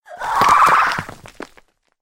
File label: die